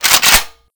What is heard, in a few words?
pump, weapons; 590